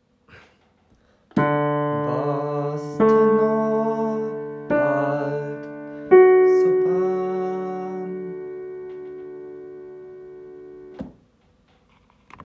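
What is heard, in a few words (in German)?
Advent jodler Toene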